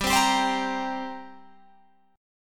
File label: G Minor Double Flat 5th, Guitar, Open G; chordshape; down